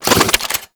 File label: Fist Spin; Warframe; Weapons; Archwing; Melee; Regor Fists